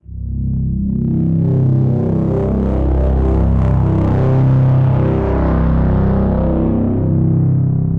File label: Dark Art Bass, Evolving Bass; Ableton; Drone Lab